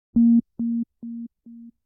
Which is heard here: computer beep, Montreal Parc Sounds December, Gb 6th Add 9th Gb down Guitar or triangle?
computer beep